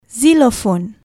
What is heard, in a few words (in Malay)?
xilofon